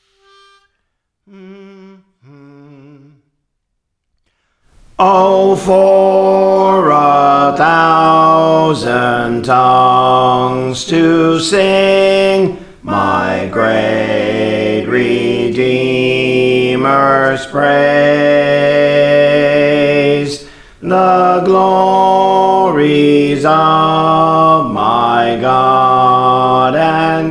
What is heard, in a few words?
Bass, Songs; Praise For The Lord Training; Belmont